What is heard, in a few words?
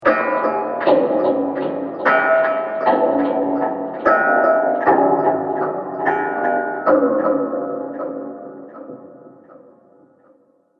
Reverberating Hits And Bangs; Miscellaneous Impact Sound Effects; Andrew Fleming